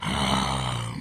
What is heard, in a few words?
loading bar zombie